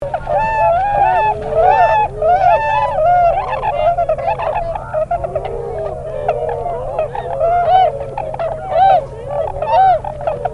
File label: Tundra Swan